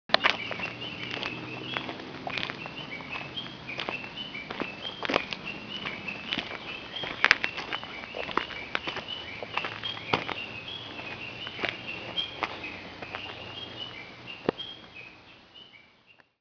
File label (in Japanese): Saezuri